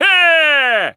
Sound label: Wizard Heal Grenade